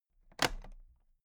toolbox latches open soft

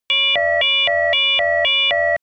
sci fi alarm small personal beep polyphonic

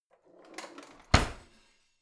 old drawer creaky in hard

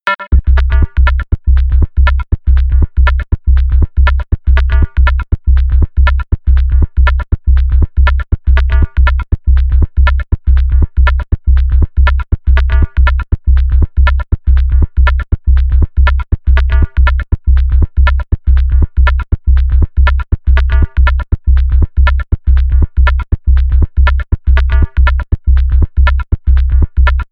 level music

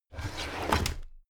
drawer cabinet crammed open heavy

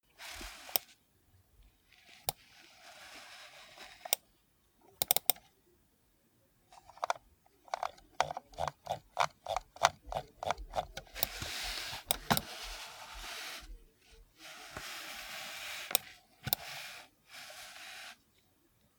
computer mouse usage sequence

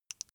flesh wet fast squish single

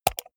computer mouse wheel click fast hard

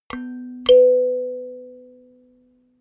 notification kalimba octave up

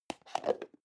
plastic bowl small close

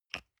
toothpaste screw cup open soft single

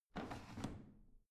washing machine drawer out

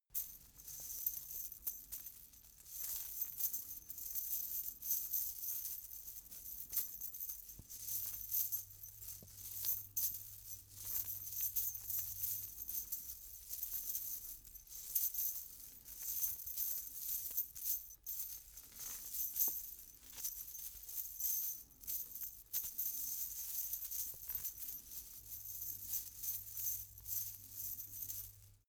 chain mail handling

